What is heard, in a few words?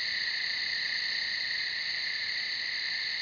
NIGHT INSECTS